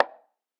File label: Conga, Afro Dancehall; Afro Drums; One Shots; Ghosthack; Drum Mega Bundle Demo